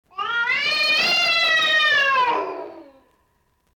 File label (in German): cat schrei